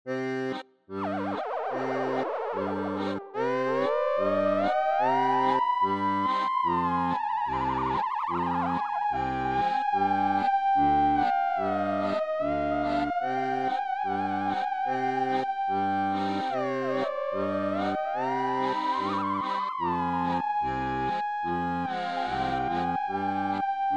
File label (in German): Theremin Akkord